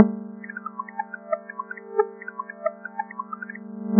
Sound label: risset beats